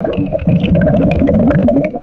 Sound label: close-up hollow water bubbles gurgling